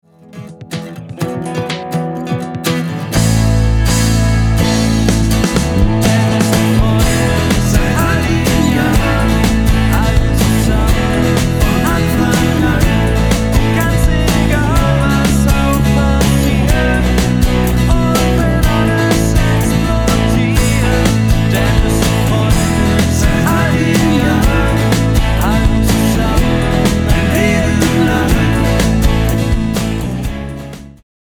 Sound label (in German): freunde karaoke